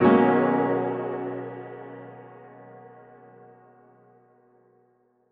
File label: Ballad Grand B maj